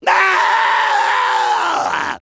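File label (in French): Soldier pain critical death, fr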